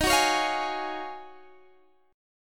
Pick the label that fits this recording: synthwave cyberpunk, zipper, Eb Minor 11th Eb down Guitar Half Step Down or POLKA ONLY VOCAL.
Eb Minor 11th Eb down Guitar Half Step Down